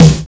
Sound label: 80s Drum Snare; Yamaha PSR E305